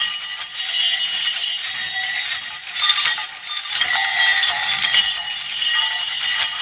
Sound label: lock It Near Win; netent; narcos